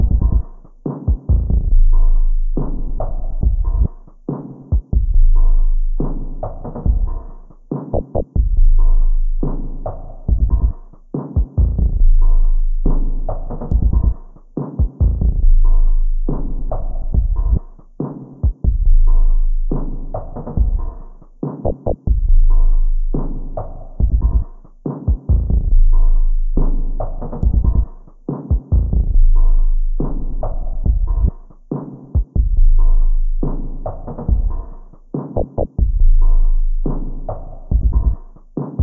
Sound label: Backbeat 70